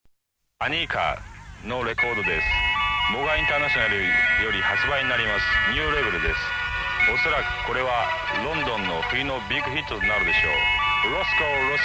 jingles; Rosko

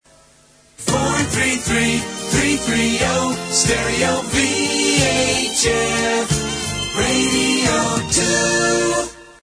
jingle

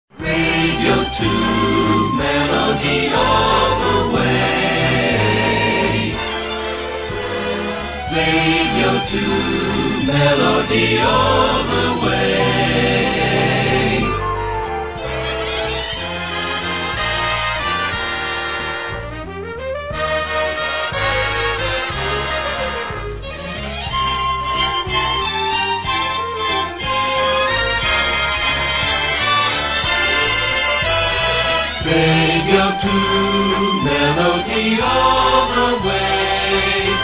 jingle

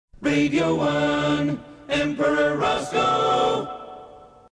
name jingle; emperor rosko

